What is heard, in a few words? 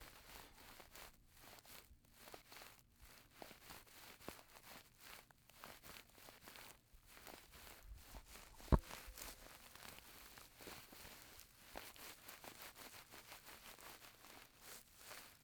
Dry Grass